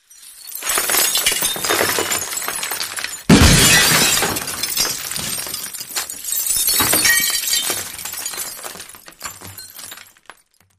Glass Metal Crashes; windows & mirrors

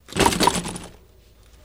Bike Bounce Rattle

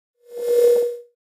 Elect Wash Static Rev

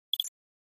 Flash Beep Dbl HF Elec

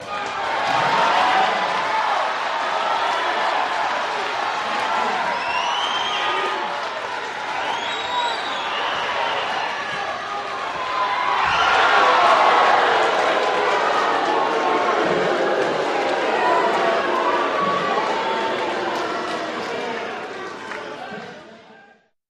Crowd Walla, boxing

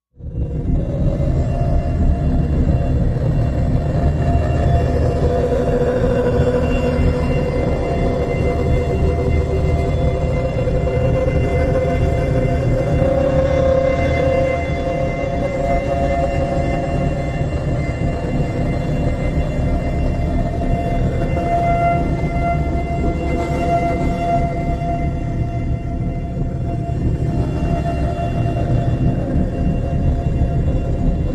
Spoiler Machine Lrg

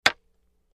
Wood Staff Hits Light, spears & staffs

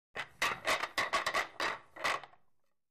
Billiards Racking B

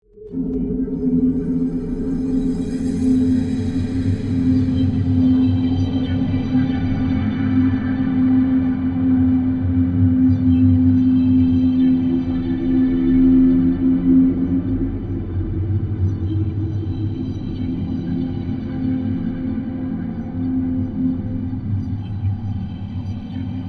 Musical Chime Melodic

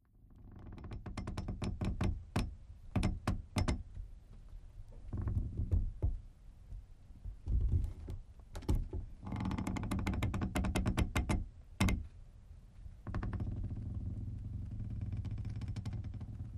Rope Hemp Rope Creaks

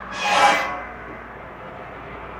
Sawmill Blade Off

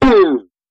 Boing Down; boings

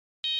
High Button Beep Shr

